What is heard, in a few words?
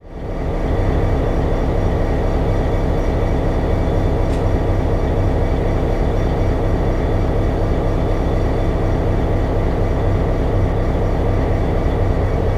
Train Diesel Idle; Sony Pictures Sound Effects Series; Vehicles; Trains